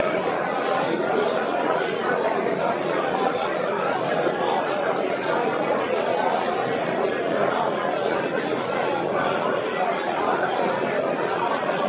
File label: crowd